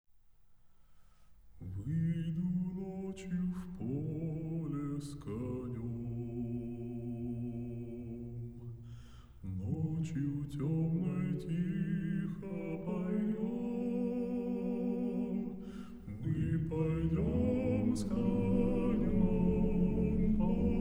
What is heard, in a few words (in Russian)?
Хор